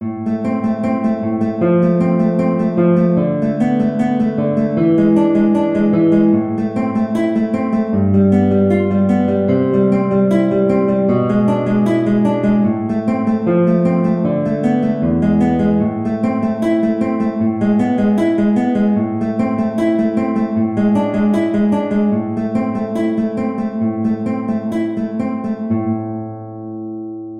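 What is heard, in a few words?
Prelude In A minor, guitar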